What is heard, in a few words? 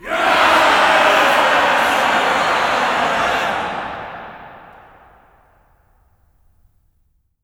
SHOUT CHOIR; LAUGH